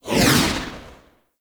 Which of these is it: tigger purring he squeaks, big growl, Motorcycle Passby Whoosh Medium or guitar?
Motorcycle Passby Whoosh Medium